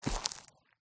Footstep Step Dry Grass Shrubs Pine Needles Meadow; PMSFX